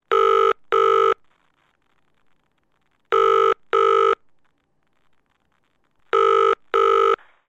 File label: household telephone uk ringing tone in ear peice